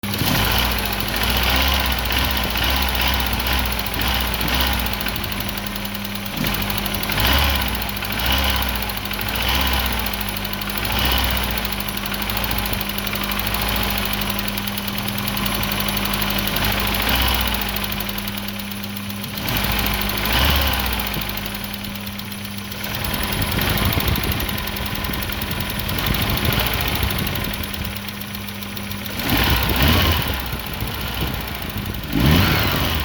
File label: noise